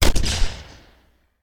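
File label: Artillery Grenade Launcher; Sound Ideas; Guns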